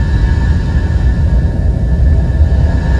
charge loop, weapons; tfa cso; holy sword

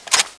clip in; tfa cso; galilcraft

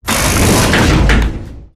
fortifications generic repair; gow

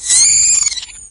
tick death; doom3

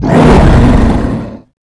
dragon hurt

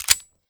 slide back, weapons

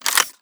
boxout, weapons

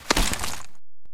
mantle low mantle

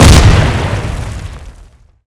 tank shell; wac

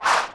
glaive swing

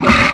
vox attack

